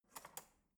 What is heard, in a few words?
cutlery fork drawer grab soft